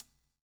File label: COPS STK; Spectrasonics - Backbeat